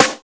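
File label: tuff snare; Drums In Your FACE!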